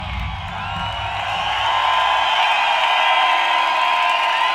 Bass Solo

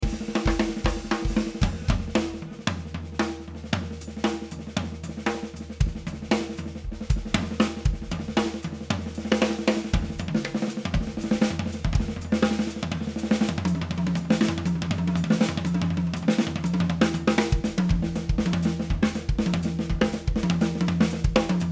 Drums

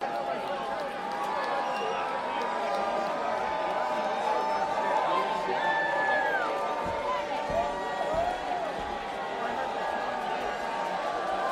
Crowd

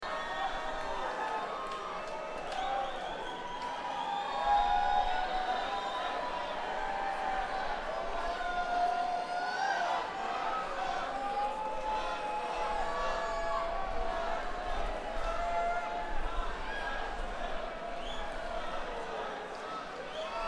Crowd

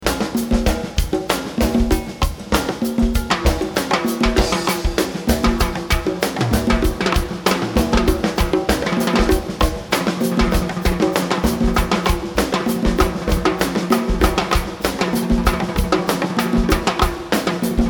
Drums

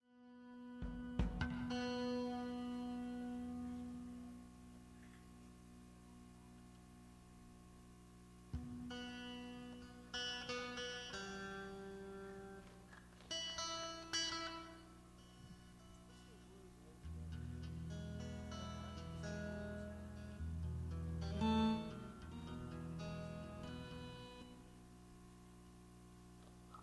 Tuning

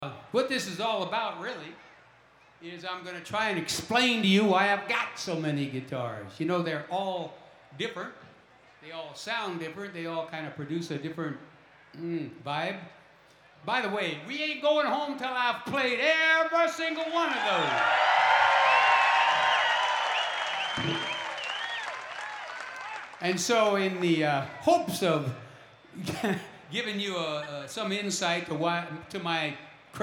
Guitar Moment

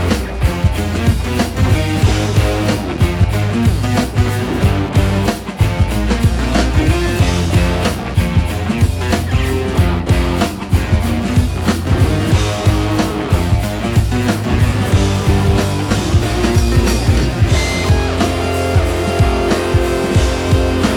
EWI 4000

